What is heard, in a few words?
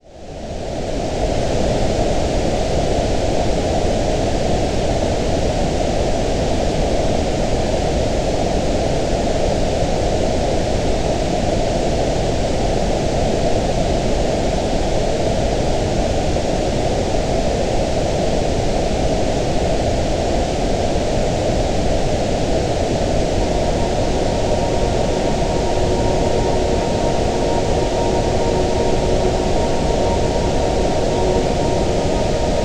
Super White Noise